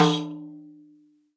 RIQ RIM; SoundBytes - Early Patches

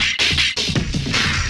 Loop, Drum & Bass, 160 BPM

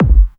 KICK deep, Techno Trance DRUMS

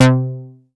MOOG BASS C4; Best Service Dream Experience

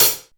HH OP, HH OPEN; AKAI; USB Soundscan vol.20 - Fresh Disco House I

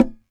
SAZ TAP; Spectrasonics; Hans Zimmer Guitars VOL-2

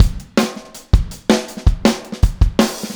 Full Mix, Drums, 162 BPM; Drums from the Big Room The Mixes; Take Your Toms Off; Verse

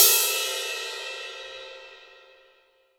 ROCK 18 CRS, CRASH

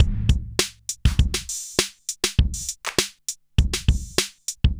Smooth, R&B Drums

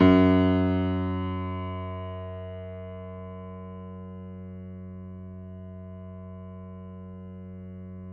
piano; East West; The Ultimate Piano Collection

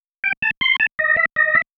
Single Riff, Organ

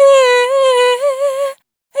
Servitude Dry, vocal samples; Sonic Foundry (Sony Creative Software) - Pocket Diva World Class Vocal Samples; Op-Erratic Loops

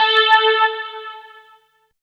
lead syn, Techno Trance; Ueberschall; Techno Trance Essentials; SYNTH

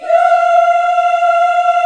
F 3, CHOIR YAS, Africa; Spectrasonics; Heart of Africa 1

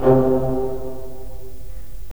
BRS, Roland LA Composer; Roland - LA Composer vol. 3

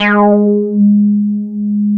G#4, Bass; E-MU Producer Series Vol. 6 – Analogia Project (CD 1); Boom da Bass 2

